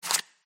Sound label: card slide